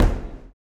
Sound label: STOMPS; Spectrasonics; Vocal Planet; Blues Soul